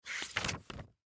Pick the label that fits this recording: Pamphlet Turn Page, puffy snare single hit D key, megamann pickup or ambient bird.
Pamphlet Turn Page